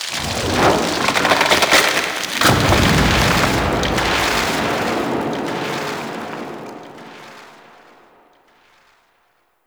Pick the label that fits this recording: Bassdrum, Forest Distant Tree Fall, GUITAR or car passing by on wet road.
Forest Distant Tree Fall